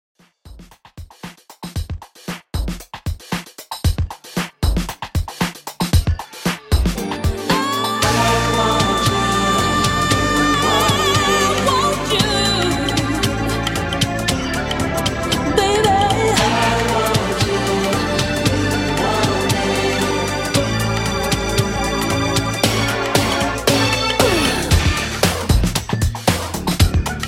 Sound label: 90s Soul 115Bpm